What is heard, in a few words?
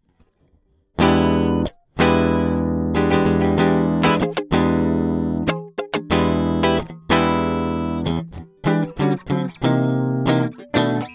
Chandler TG 1 E Guit THD Lim gr 12 db